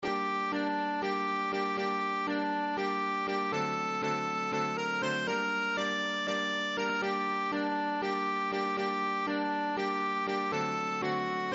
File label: Ruchenitsa slow